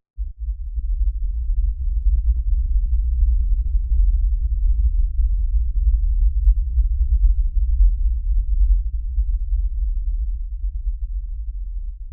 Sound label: sub bass; Sound-Graph